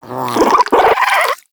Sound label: Vocal Captured Idle, Hunting Critters; Nexifera